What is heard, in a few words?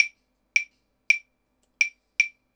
claves 80 bpm, latin